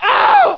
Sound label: pain; HEXEN2; player